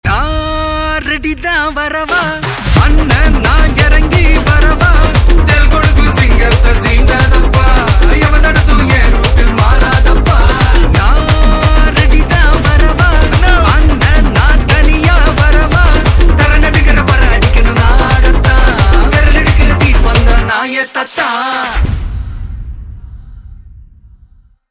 tamil ringtone

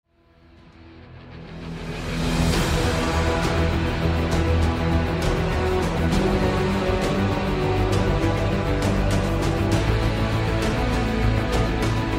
mobile ranked lobby music; pubg

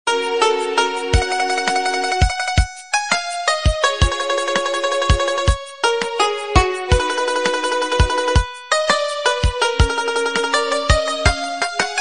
instrumental ringtone

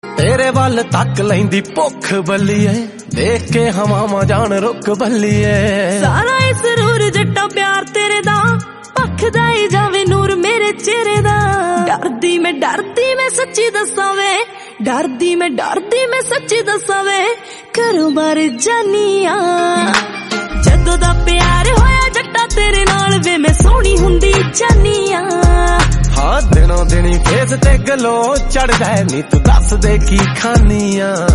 punjabi ringtone

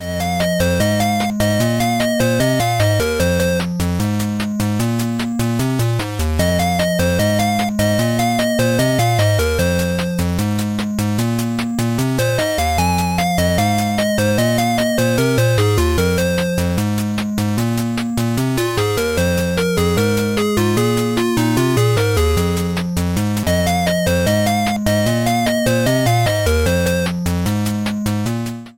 cool retro electro

